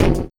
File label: Spitter Kick, Esoterik Beatz; Spitter Kit